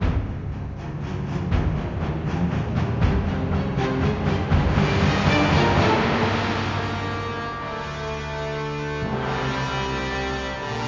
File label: lose battle; legrand legacy tale of the fatebounds